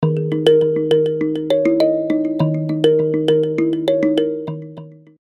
Mbira, alarms; ACE Clever-1

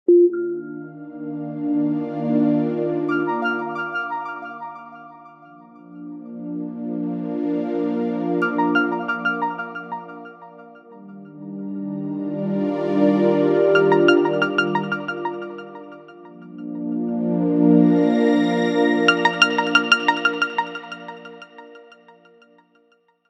Cloudscape, ringtones

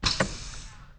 Airlock Open; Siemens SL65; ProSlide

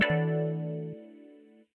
Nebula, notifications; Samsung Galaxy S24

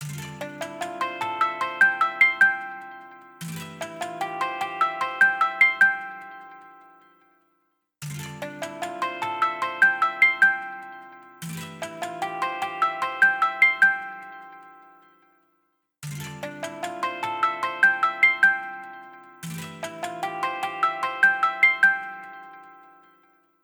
Strumming, ringtones; LG Leon 4G LTE

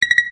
Click; Alcatel; OT-991D

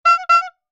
Old Bicycle, notifications; Huawei HarmonyOS 2.0

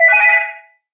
Cobalt, notifications; SKY IM-A910S VEGA Iron 2

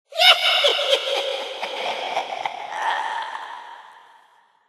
Ring Cackle; Microsoft; Windows Mobile ringtones; Ring Tone Pack 6

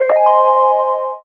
alerttone shine; The Light Phone II

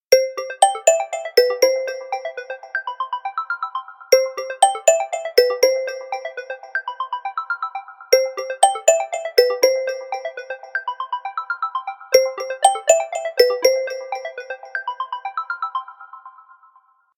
Elegant Running, ringtones; Infinix XOS 15

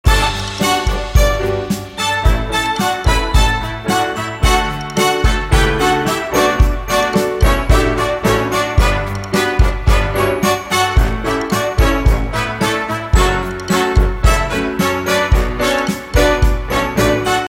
Light and happy, ringtones; LEAGOO; M9

